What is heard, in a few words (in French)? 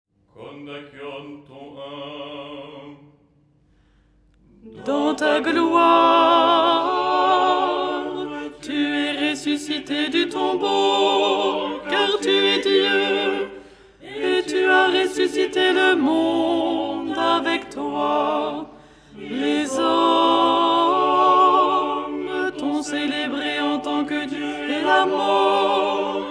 alto